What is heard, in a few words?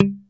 G1 DEAD, STIK BASS; D-STIK BASS1